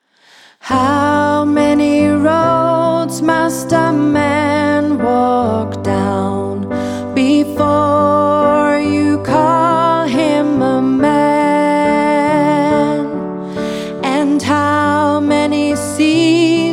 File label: pop piano